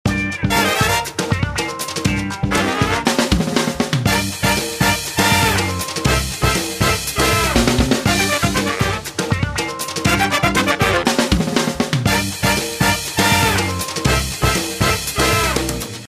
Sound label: Brass section, Melodies; Samsung SPH-M240